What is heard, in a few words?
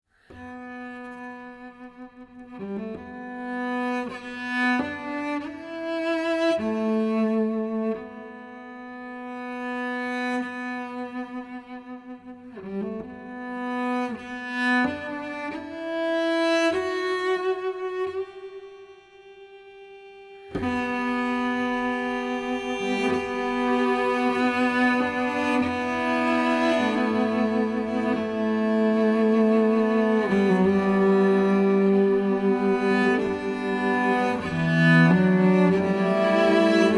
Cello Choral